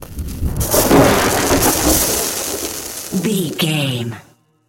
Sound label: elec lightning magic spell